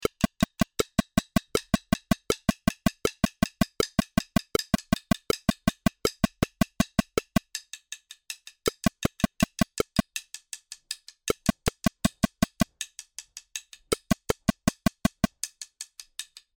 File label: Sticks n Click sticks correct